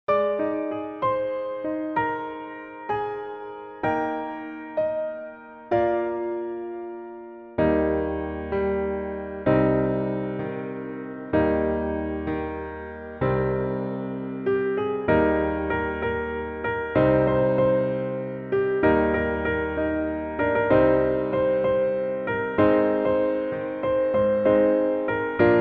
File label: Piano